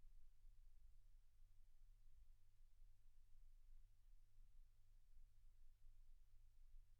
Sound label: silence 48kHz